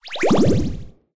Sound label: poison; balatro mods